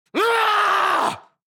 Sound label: Attack shout anime villain vocalization; gfxsounds